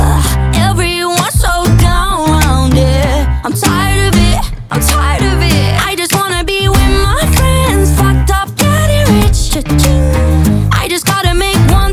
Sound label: Acoustic